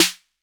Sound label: 808 SNARE; Concrete Collage Drum Kit; Concrete-808